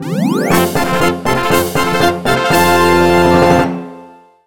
(Jingle) Practice Catcher - Super Success!!

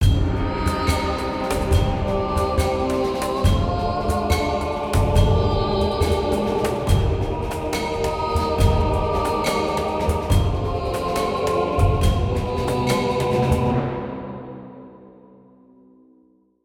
Battle Drums

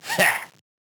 Dwarf Boost; dungeon marathon

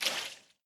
deepwater footstep

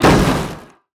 Loop Impact; Necromancer Skill1; Portal Quest

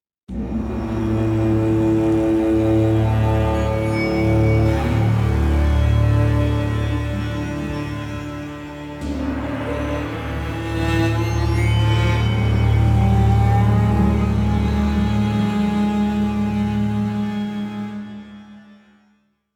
Monster Hunting; Skellige; Monster Hunting 01-4

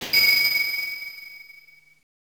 COIN; Ashura Blaster; Taito B System; arcade

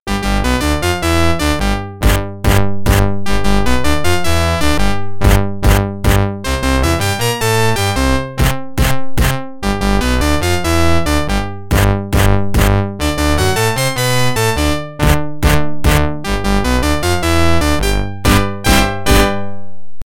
Organ

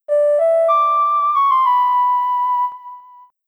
Ocarina; The Legend of Zelda: The Minish Cap

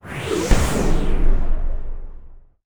whiteout

